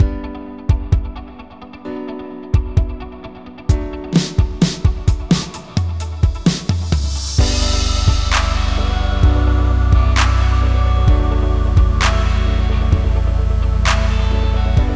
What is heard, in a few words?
Uplift, instrumental